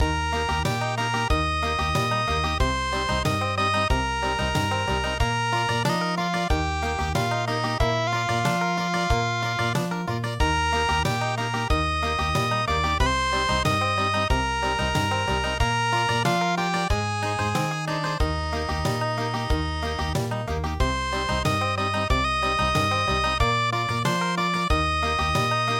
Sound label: Dreaming Of Home And Mother country